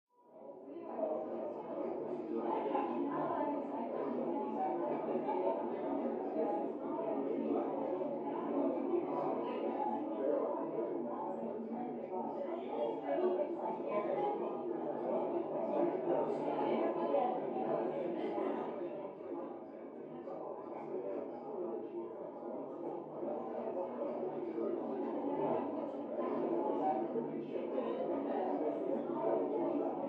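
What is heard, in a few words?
Party Medium Adult Walla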